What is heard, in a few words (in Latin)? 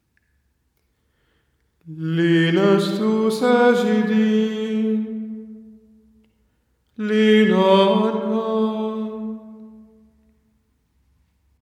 Flectamus genua; Friday; لنجث ساجدين